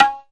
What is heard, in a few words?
ROLAND SPD-20 PERC TABLA